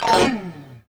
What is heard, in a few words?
CHORUS LID, Guitars; Spectrasonics; Hans Zimmer Guitars VOL-1; FUNK CHORDS